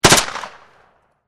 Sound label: MP5 short burst, Submachine Gun MP5 Short Burst; Machine Guns; VRSonic